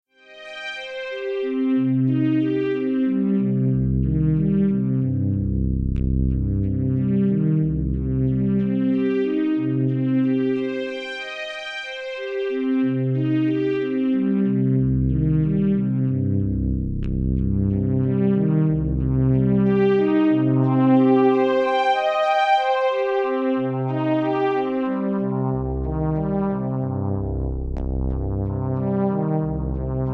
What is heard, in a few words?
string ensemble playing with eq, triple chorus